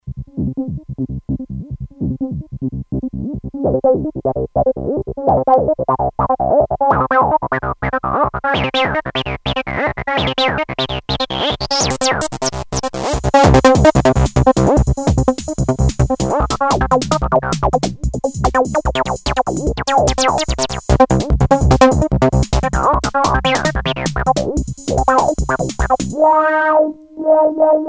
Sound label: ring